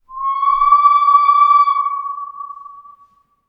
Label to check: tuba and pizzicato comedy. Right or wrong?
Wrong